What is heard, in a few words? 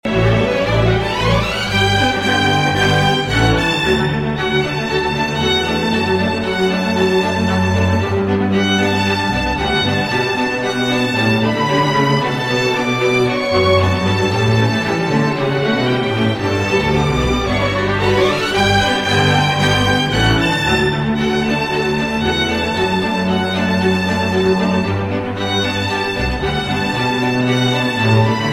string